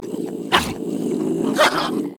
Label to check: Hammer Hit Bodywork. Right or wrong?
Wrong